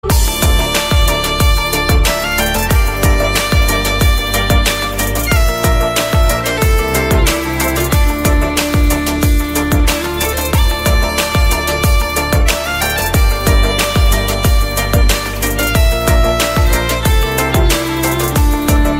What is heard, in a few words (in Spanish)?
tono de violin sexy